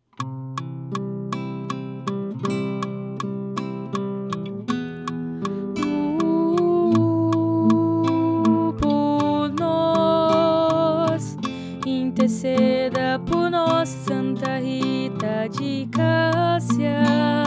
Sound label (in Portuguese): Contralto